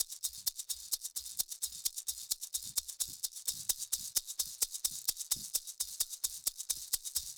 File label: Percussion